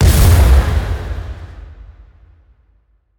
explode; light rune explosive

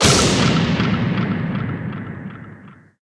SPELLS Smash

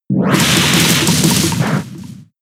Thrash; Pokemon; gen 6; XY; ORAS

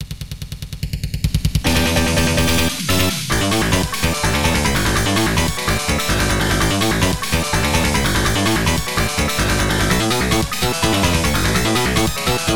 Funky Bomb; Strike Fighter; arcade

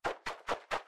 Triceratops Walk Loop; Mo Dino

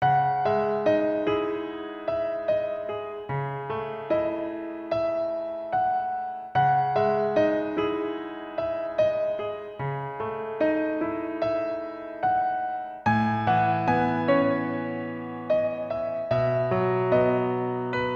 Abyss - Only the piano; Miageta Sora ni Ochiteiku Original Sound Track

